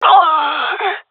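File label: death, female; vo; Counter Strike Model Pack; CS:GO; seal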